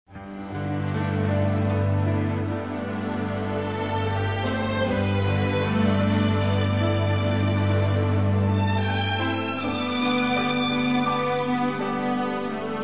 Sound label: Orchestra Panpipes, Ho, Everyone That Is Thirsty